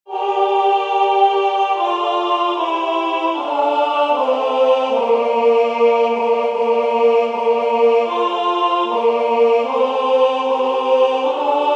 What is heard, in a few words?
Jesu Rex admirabilis altus; Palestrina